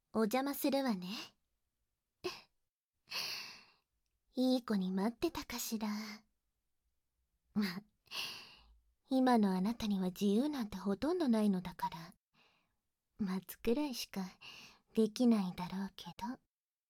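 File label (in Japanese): 左右耳舐め（バイノーラル）, ASMR; プログラムLV2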